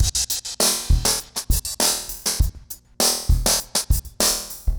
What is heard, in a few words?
Remixed Drums 100BPM; The Roots Drumloops